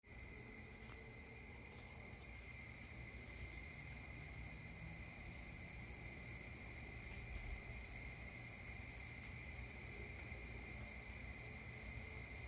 Late August night Berkshires MA

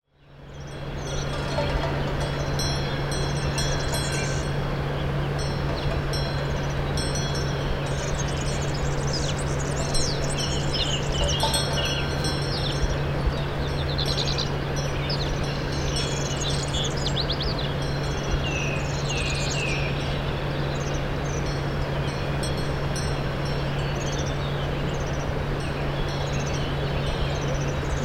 Donkeys Song; Aulus-les-Bains

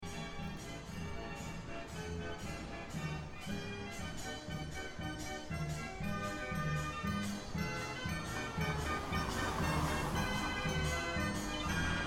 street organ, Field recording; Gouda

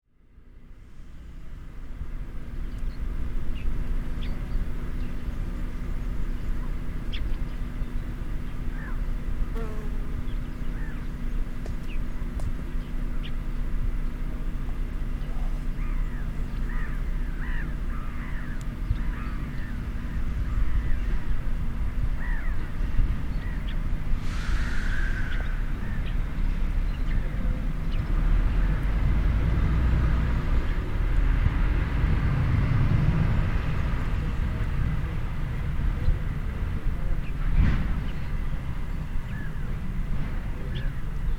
At the closed border

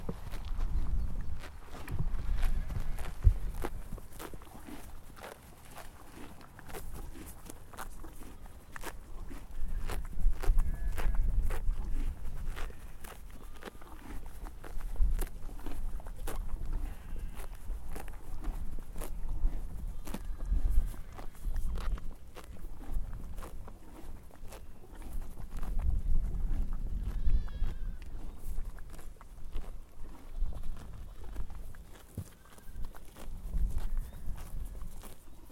Grazing Horses; finnisglin county galway ireland

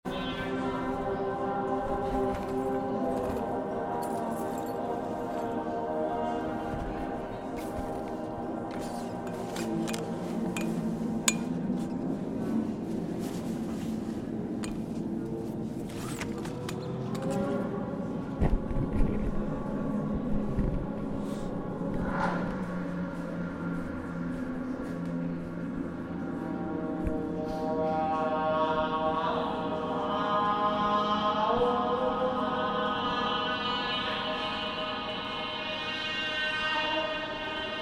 subway drone music

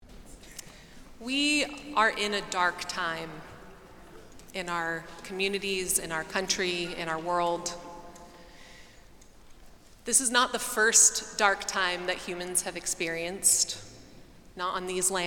resistance sing, New York City; 3 mics; riverside church

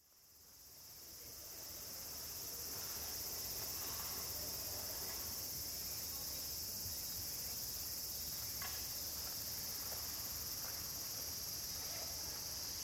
Meiji Jingu Shrine; Attendant

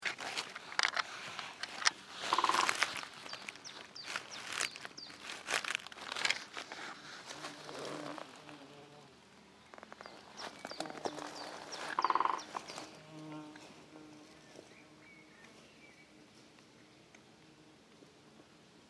Forest Bern Switzerland

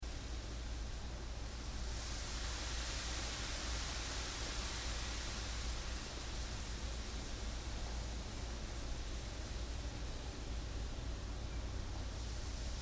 bamboo; Fire Island

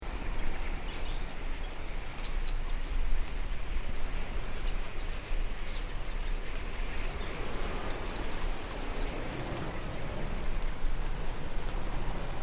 10000 Starlings

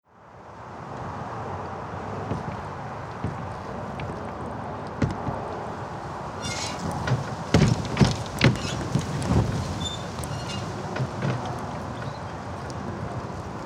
Garden Fence; West Malling

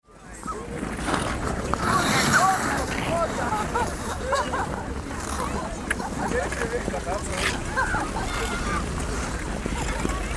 skating rink, field recordings; sergiyevposad

